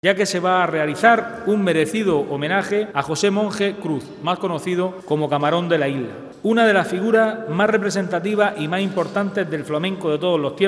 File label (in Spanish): FLAMENCO